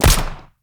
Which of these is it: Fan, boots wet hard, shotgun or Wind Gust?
shotgun